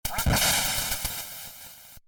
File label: gun shot sound